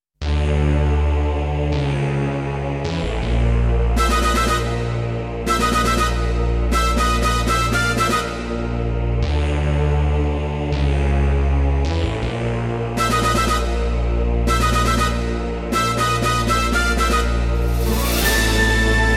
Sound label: BATTLE BGM Mechanical Emperor; galaxy fraulein yuna music wave 3